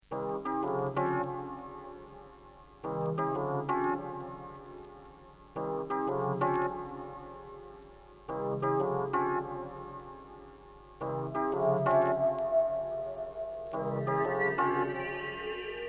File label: Trap mix